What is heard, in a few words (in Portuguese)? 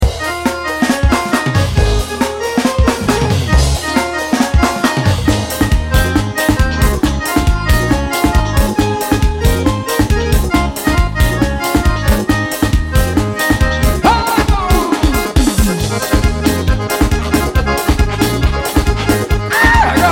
forro no interior